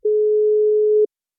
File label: call outgoing; tweb-i2p